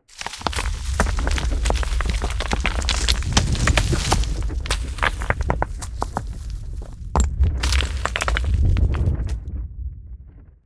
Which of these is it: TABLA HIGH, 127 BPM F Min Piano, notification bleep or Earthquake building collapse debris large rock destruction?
Earthquake building collapse debris large rock destruction